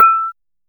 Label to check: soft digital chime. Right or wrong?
Right